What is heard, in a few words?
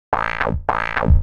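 synth bass, drum and bass, 160 bpm, C; eJay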